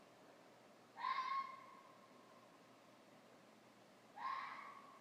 red fox vocals

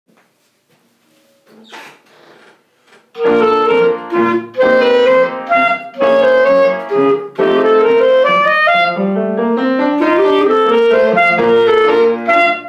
clarinet

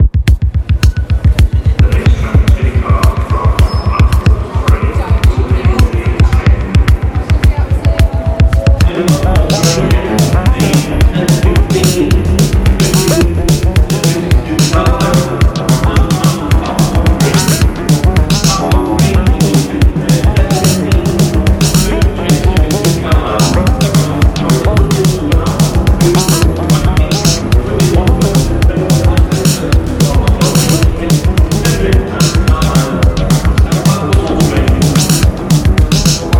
train techno a remix of birmingham new street

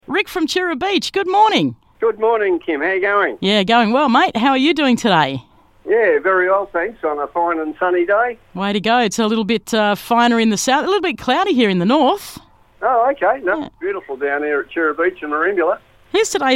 pick the riff; tura beach

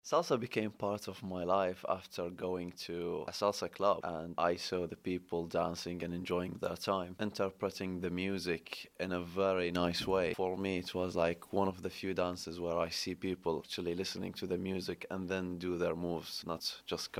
Salsa

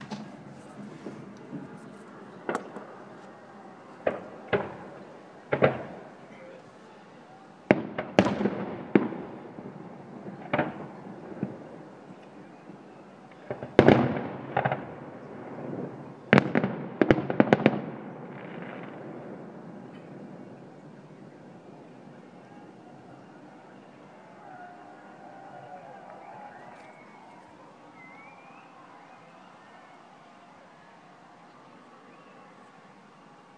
bonfire

